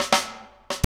Funky Drums; AMG; Now CD-ROM (Roland); Neil Conti

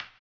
HAMBONE; ILIO; Vocal Planet VOL-2; Blues & Soul

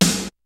kicks snare, kid; Kid Nepro; Elite; KICKS SNARES

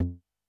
Tomtom Low, Technics U90

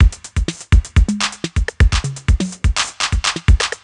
drum loop chit full 125, Classic Chicago House; Sample Magic; Drum Loops

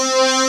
RESO STRING; Rubber Chicken Software Sample Bank

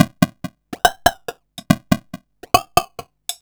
Crushed Perc (140BPM); musicradar

